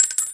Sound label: shell, Players